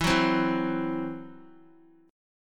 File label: E Suspended 2nd Sharp 5th, Guitar, Esus2#5 chord; strummed; x,7,6,5,4,x